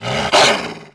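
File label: Wolf Attack Vox; Civ4 warlords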